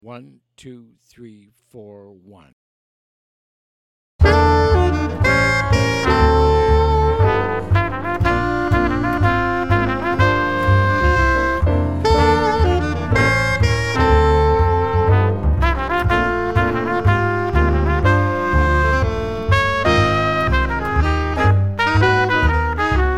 minus Drums

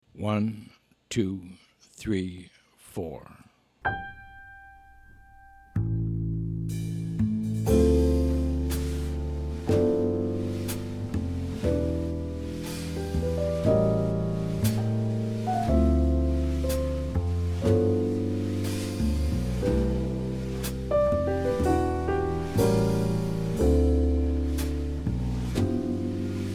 minus melody

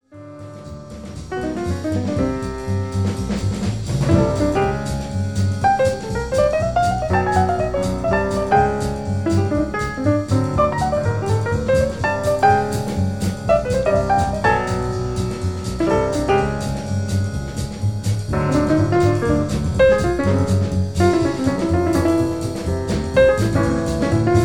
solo

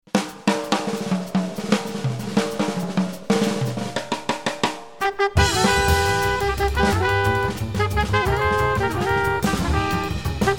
minus piano

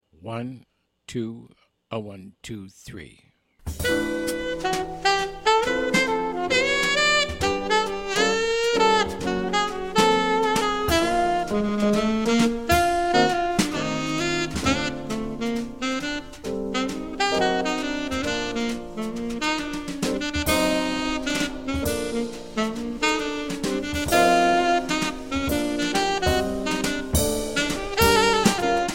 minus bass

